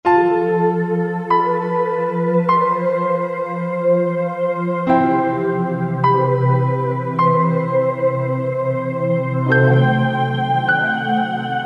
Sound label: Humming Pno, Roland JV-2080